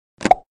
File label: egg spawn; deathmatch